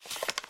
announces in; games; Corrida Romance; Wazdan

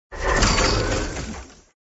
buff open; Thunder Fighter

